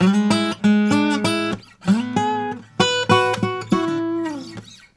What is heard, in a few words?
Blues Guitar lick; ILIO; Fretworks; 96 HIRUM LICK